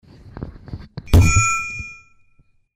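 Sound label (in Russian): звук падения микрофона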